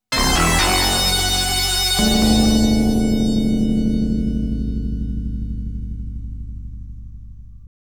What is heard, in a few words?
Game Over BGM; MUNEN